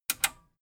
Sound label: metal button radio click disable fast